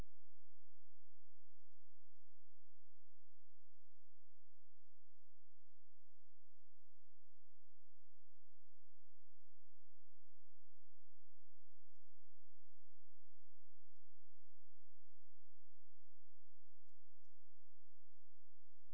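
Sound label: hydrophone; ICLISTENHF; 16000Hz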